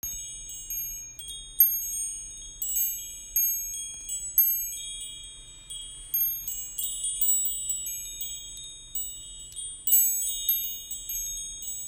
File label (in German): Klangspiel Tigerauge